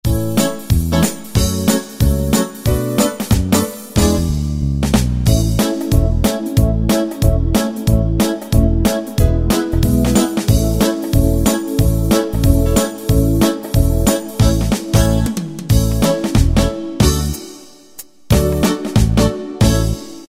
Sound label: Foxtrot, Yamaha QY70 Preset Styles